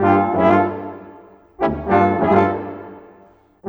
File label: Brass, Orchestral, Rock Pop